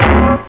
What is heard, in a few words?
hit wall; cstrike; banhammer